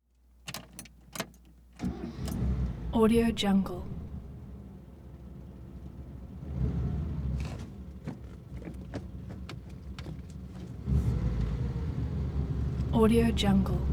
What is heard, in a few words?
vehicle constant interior start gear shift